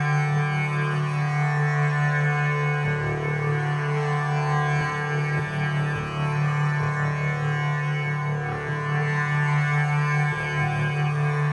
ethno, 125, A

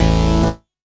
KEY SHOT TEKNO 140 A; Techno2; Singleshots

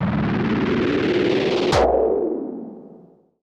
SPACE FX 140; SpaceSounds

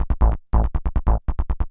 SNTH BASS ELCTR 140 A, Techno

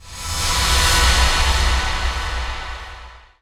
TECHNO FX 140

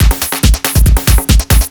TECHNO BEATS 140

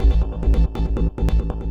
Industrial 140

